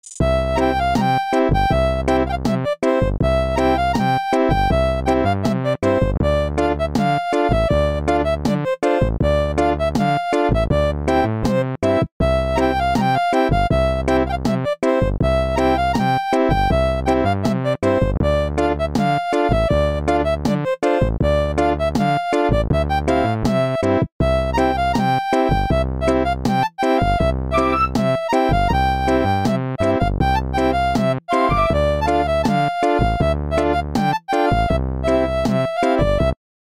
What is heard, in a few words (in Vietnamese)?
Nhac Chuong Nokia 6610